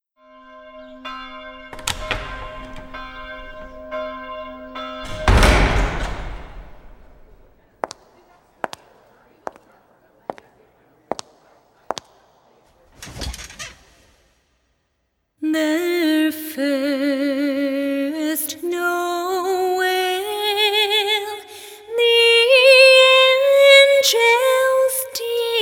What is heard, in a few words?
acappella